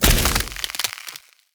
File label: frost freeze